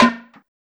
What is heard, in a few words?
fx-percussion, Techno; Techno ID; single-drums